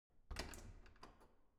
object door corridor soft open

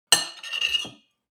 eat cutlery on plate scrape squeak; foley; smartsoundfx

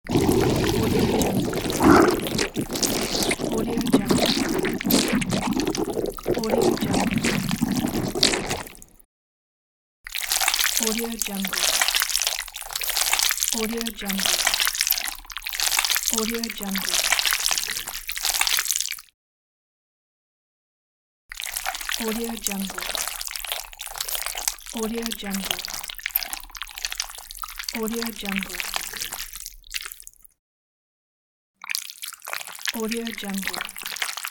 monster slime

